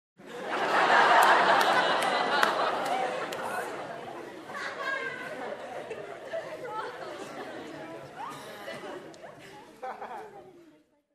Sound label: Group minimal claps and laughs